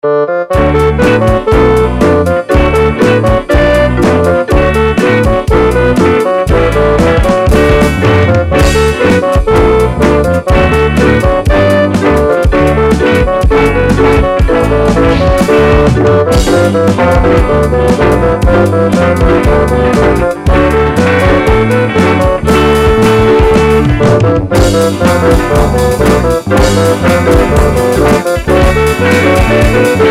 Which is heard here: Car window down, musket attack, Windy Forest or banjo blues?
banjo blues